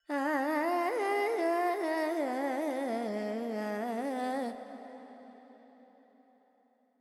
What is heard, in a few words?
Pentatonic, Vocals, Carnatic Female, Alaps, G